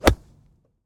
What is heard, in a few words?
sports golfing golf club swing hit ball wedge from fairway; The Chris Alan; GOLF - Swings, Hits, Hazards, Golf Carts, and More; GDC 2019; Game Audio Bundle